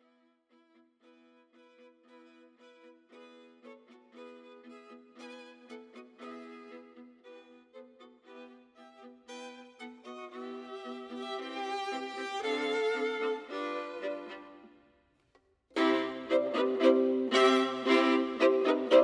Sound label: allegro risvegliato; johann wenzel kalliwoda